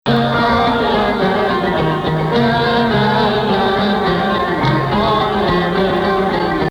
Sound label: Rast Ya Shadi Rast; muwashshah in Rast